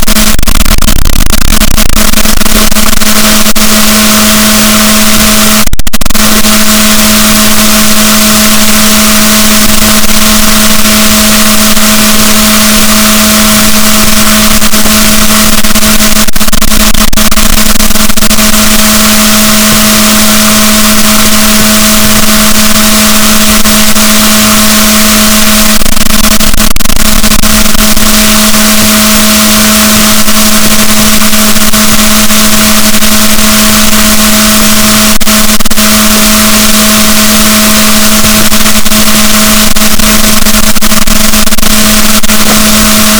rorquals positif, gaussian noise, BalaenopteraPhysalus Chile